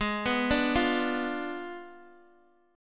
G sharp Diminished 7th, Guitar, Open G; x,x,6,4,3,3

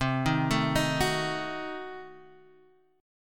C Diminished 7th, Guitar Open F, chord; arpeggiated; JGuitar

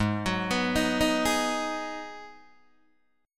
G sharp Minor 7th G sharp, Guitar Open D

